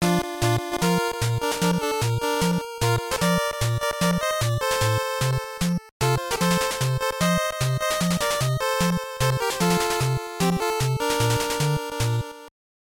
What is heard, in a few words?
Victory; Bad News Baseball